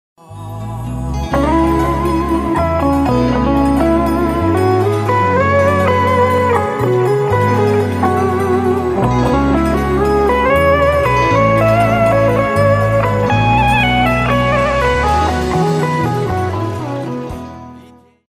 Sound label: jk solo